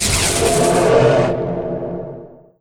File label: berserkers call; weapons; dota; axe